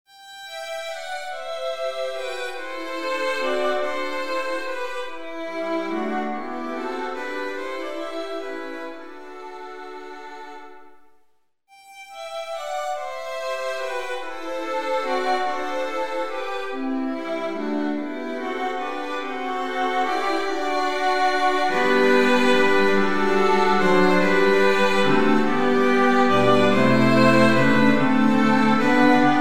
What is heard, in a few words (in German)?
streichquintett